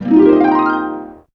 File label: harp gliss D#; Retro Sampler